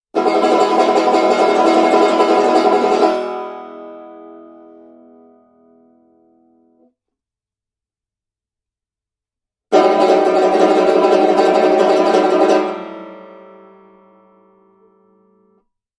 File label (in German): plectrum tremolo, banjo